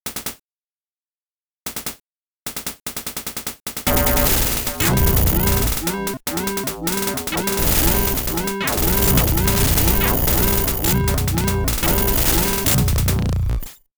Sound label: Jingle, MSX 2; Ikari Warriors